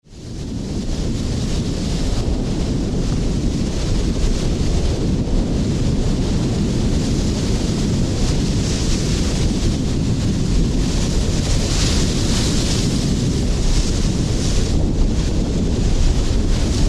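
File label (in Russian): ветер пронесся сдувая все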